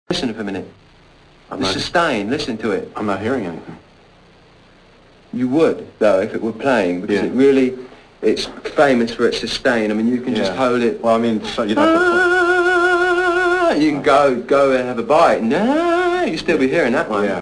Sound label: sustain